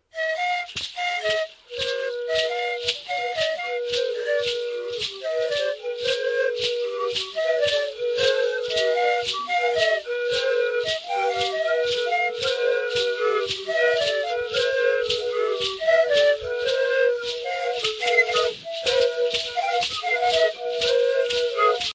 Pan Pipes, Solomon Islands; Buma

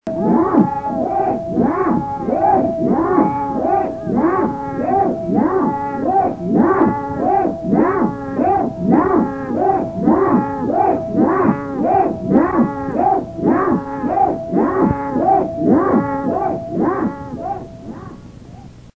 Dani Hocket

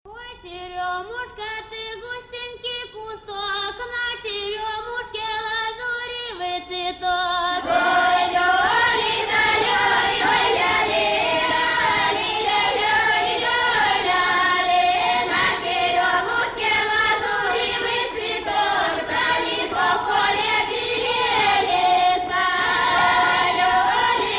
Belarus folksong

